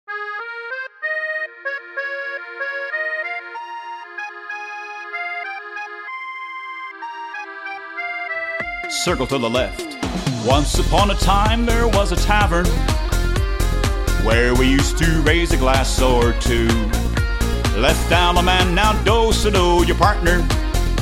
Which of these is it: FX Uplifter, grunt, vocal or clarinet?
vocal